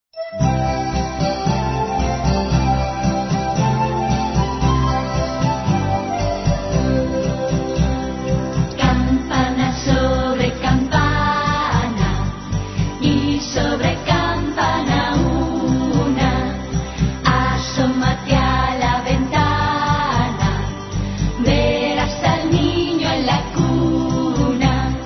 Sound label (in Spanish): CAMPANAS DE BELEN, navidad; discos amparito